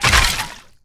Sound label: bone death